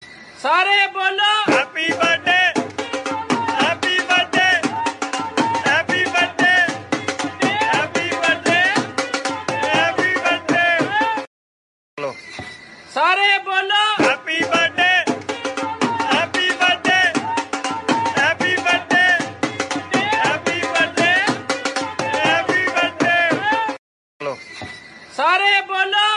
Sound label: happy birthday ringtone